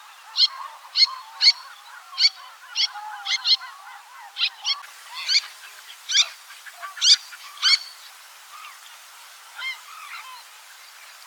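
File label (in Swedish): Smådärna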